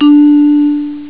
vibes; chipmusic; sonix; Rise Of The Dragon

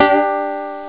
koto, instruments; sonix; Rise Of The Dragon; chipmusic

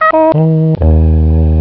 Ahh Voice instrument; sonix; Alternate Reality; chipmusic

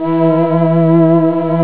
ghost octave; sonix; Rise Of The Dragon; chipmusic

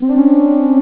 clash; sonix; Rise Of The Dragon; chipmusic

